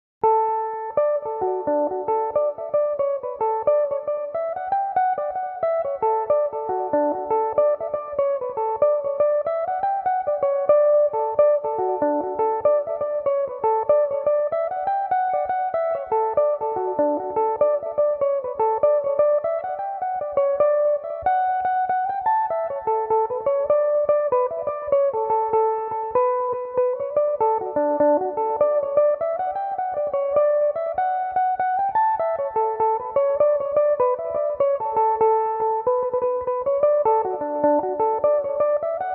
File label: the jig set, mandolin; Father O'Flynn/The Kesh Jig; Belmuse